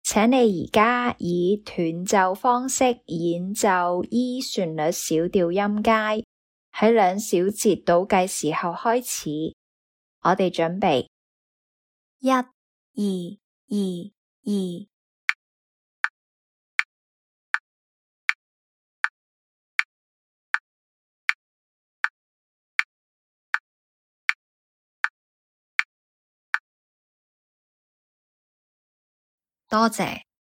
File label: e min melodic scale staccato